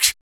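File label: vox percussion, Vocal Planet Jazz FX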